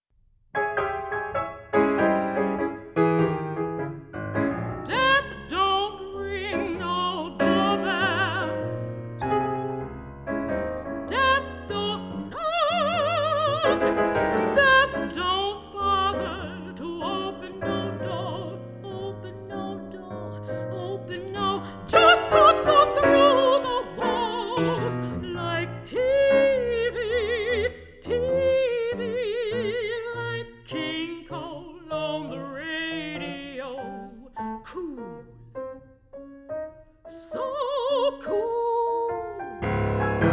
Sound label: Casual